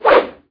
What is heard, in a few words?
throw; OutLaws